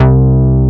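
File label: moog bass; ZERO-G; Datafile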